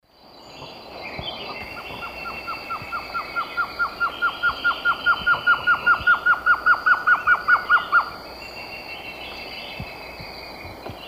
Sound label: Trogon curucui, song; S. America; Eastern Lowlands